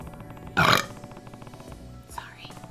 burp

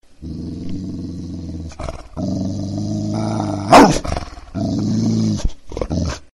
dog growling, german shepard

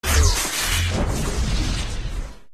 death spell

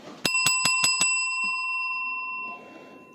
bell rings continuously

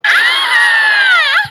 white girl jumpscare

